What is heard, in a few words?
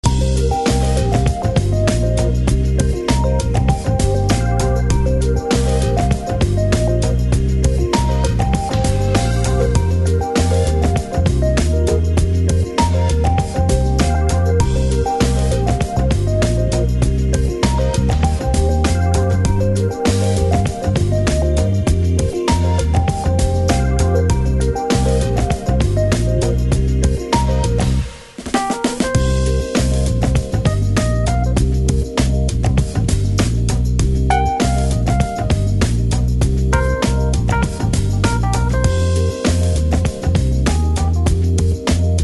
jazz; Jazz (Styles: Contemporary/Smooth/Traditional)